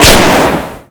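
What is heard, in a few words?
vehicle tire explosion blowout; Explosions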